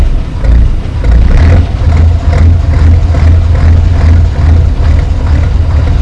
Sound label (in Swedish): tomgang; fordon